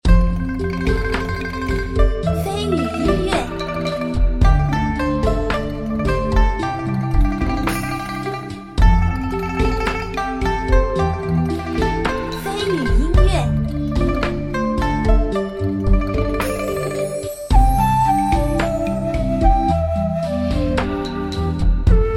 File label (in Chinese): 城市